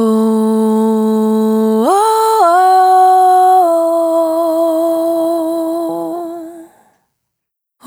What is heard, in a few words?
Single Voice Melodic Loops